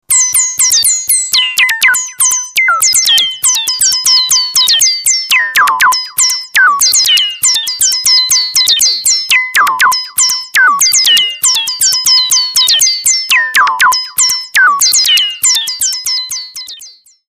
fx arp

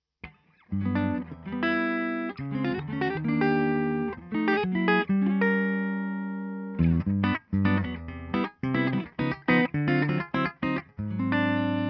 Clean Humbucker

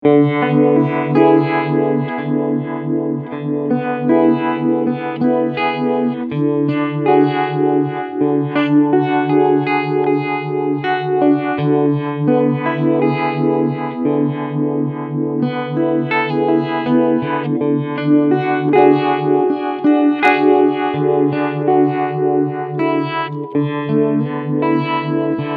UVibe Pur

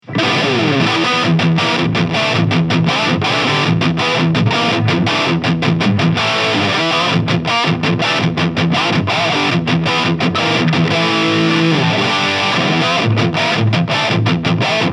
Hagstrom Swede Gold Lead

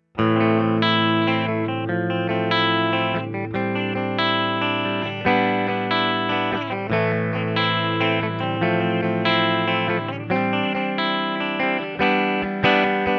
Les Paul Clean Neck Bridge Pickup; Slash Signature

